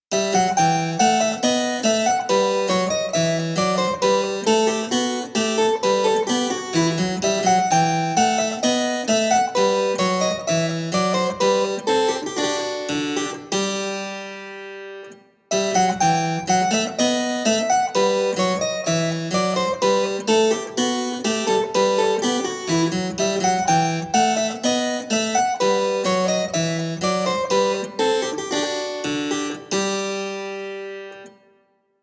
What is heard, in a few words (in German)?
Nord Grand Italienisches Cembalo; Bach Bouree